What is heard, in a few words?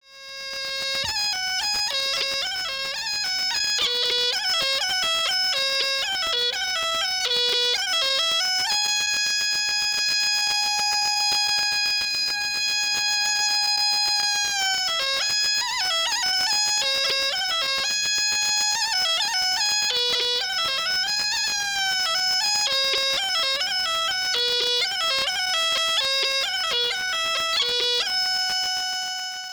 clarinet; mountainpatterns